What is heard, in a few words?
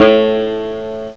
BANJO; M8; CMI Factory